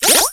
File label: turn